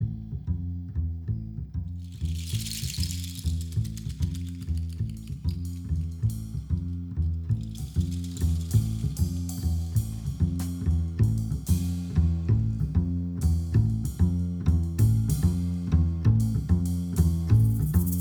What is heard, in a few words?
Tango; Tango Nr. 6